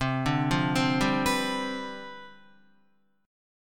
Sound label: C-Major 7th Suspended 2nd Suspended 4th Guitar, CM7sus2sus4 chord; arpeggiated; 7,5,5,5,7,7; JGuitar